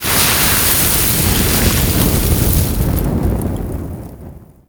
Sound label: Smithy Forge Fire Coal Rake; Soul Plane